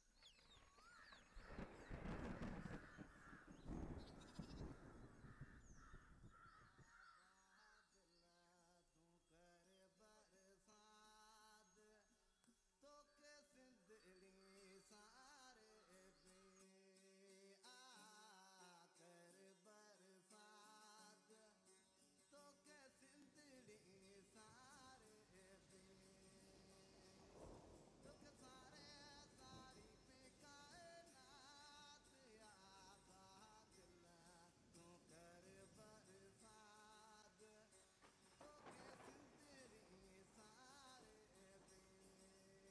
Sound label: Rain Soft